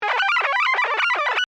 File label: Communication Radio, Scifi, Communication, Radio, Scramble, Musical, Science, Fiction; Blast Wave